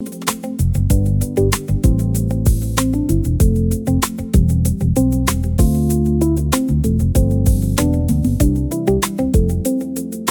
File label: loop; narration